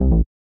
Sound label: negative